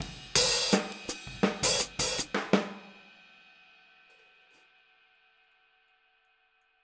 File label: Boogaloo Outro Hi-Hat, drums, 82 BPM